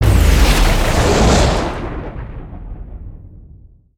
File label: Lightning Tornado; Spinjitsu